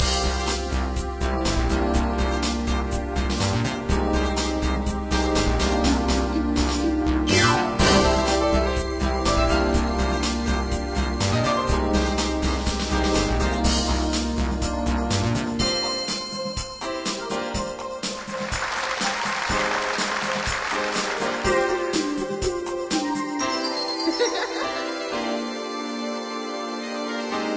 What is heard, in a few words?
background; brick breaker; bgm